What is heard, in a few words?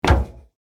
Footsteps on metal ship deck single step